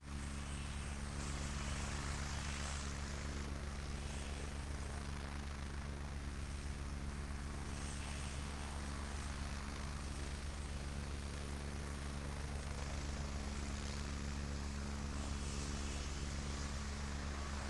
agusta a109 ext idle turbine rotors alternative tones left distant; sonniss gdc